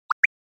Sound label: Anime Motion High